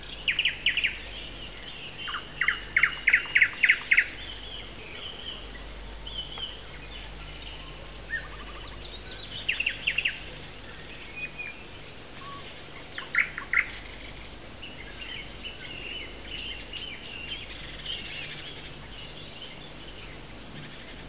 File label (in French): oiseau local